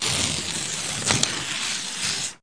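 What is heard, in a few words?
paper tear, office; Digital Sound Gallery - Volume 1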